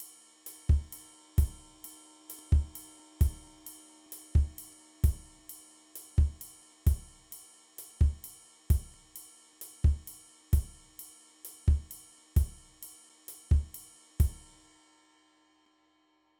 Salsa Beat

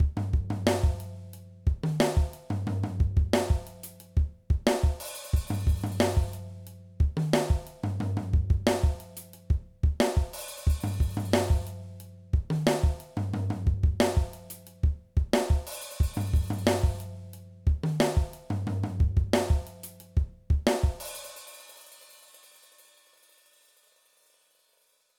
Keep a Backbeat, drum

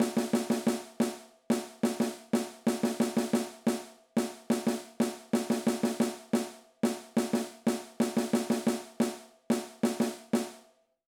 Repeat Rhythms, drum

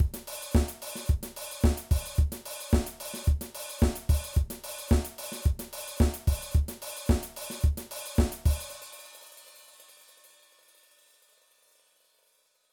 Disco Drum Beat

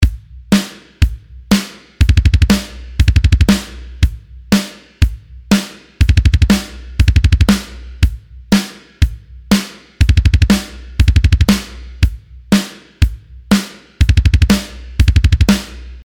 Double Bass Beat, drum

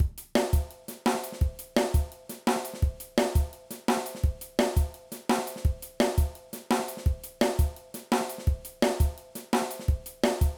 Groove Drum and Bass Drum Patterns

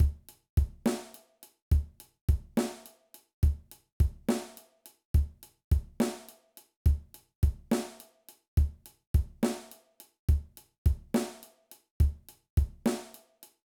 Groove 68 Drum Beats

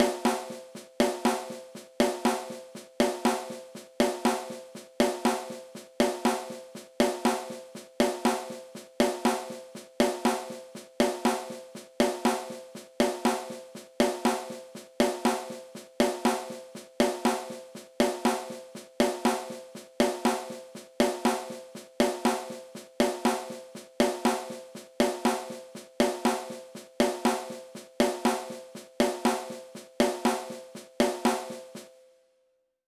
Moeller Exercise; Moeller Technique; drums

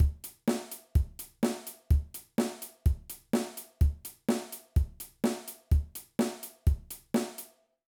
Rock Drum Beat

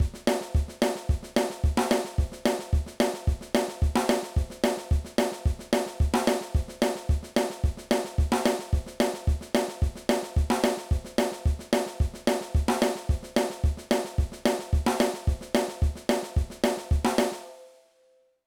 Train Beat, drum

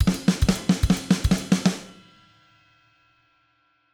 Fill, drum

